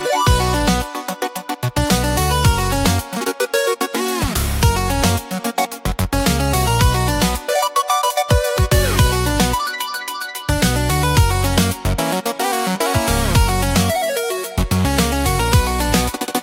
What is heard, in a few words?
music